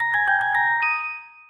spawn sound

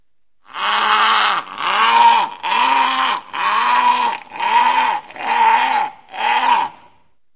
walrus war cry; arctic life

